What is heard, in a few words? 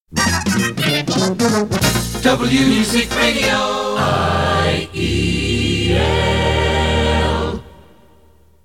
jingle